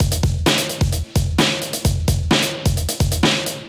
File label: Drumkit; Ableton; Factory Packs